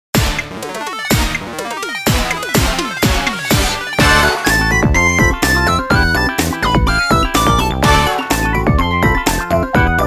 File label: Chicken Intro Music; Rhythm Heaven Megamix